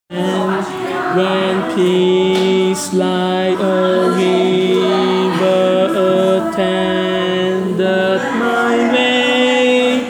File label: Tenor